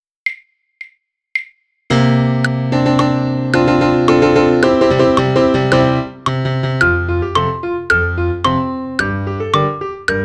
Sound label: piano xylophone click